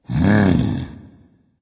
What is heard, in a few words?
thinking dragon; fafnir